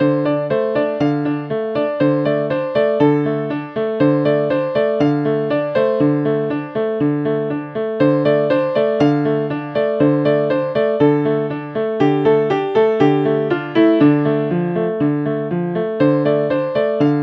akoustik Steingraeber recording studio, piano; native instruments; the grand

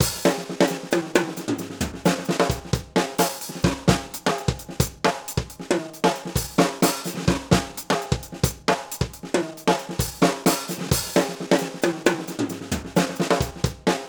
overhead; micros overhead; audioctone km-8p

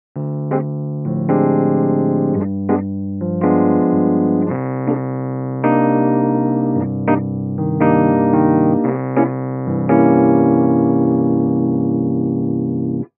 chords rhodes; eastwest colossus

